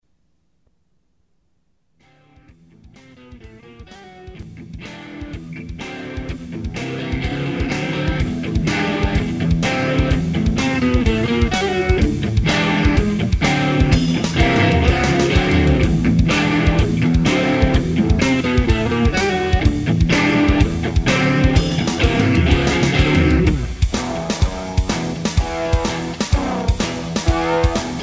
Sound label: Riff